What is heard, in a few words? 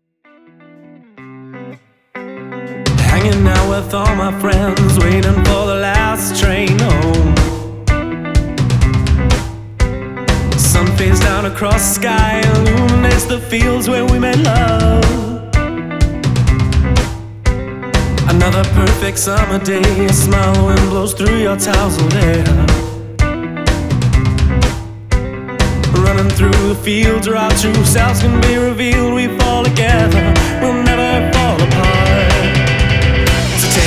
music sample; Music fragment used for recording; Elliott Sound Products; Audio Interconnects